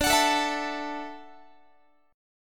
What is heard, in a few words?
D-Major Add 9th, D, Guitar, 4A, 4E, 5A, 5D, 5G sharp, 6C sharp; strummed